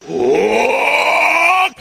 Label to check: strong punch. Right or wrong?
Right